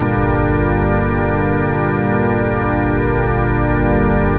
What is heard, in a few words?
orch